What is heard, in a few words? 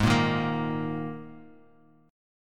Ab Suspended 2nd chord, Guitar; 3,1,3,3,x,x; 4F,4A,5C,5G,5C,6E; strummed; JGuitar